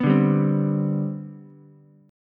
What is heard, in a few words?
C Major 7th Suspended 2nd C down Ukulele, chord; CM7sus2 strummed; JGuitar